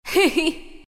laugh, zh; Dota 2

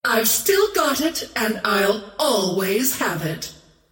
queen of pain scream

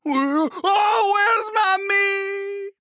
Falling; Engineer

